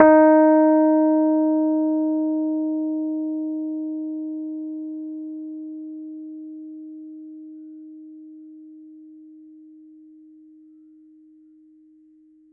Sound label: D#4, Rhodes Mark II; mkII 88 key HI; CEPCo Sample Series Vol. 3